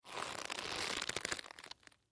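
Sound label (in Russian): ras, zvuk rastiagivaniia, stretching sound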